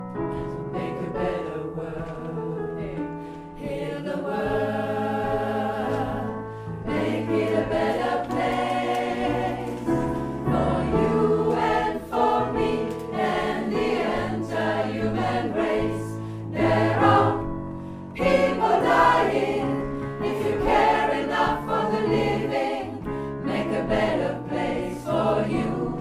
refrain tous; gospel

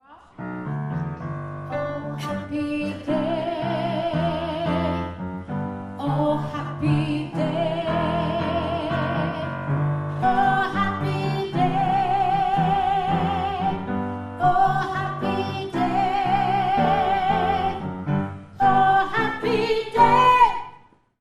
OH HAPPY DAY ad lib et fin mezzo

